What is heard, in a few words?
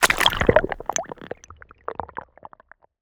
creature crab texture underwater; Bluezone Corporation